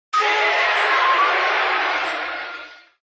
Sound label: dragon roar; blue eyes white dragon